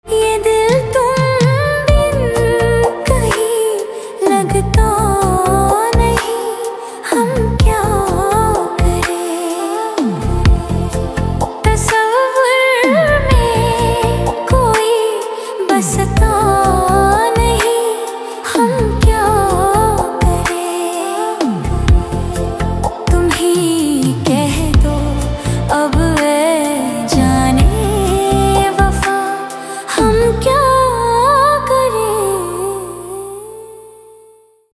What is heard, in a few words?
AI Female; Ringtone; AI Cover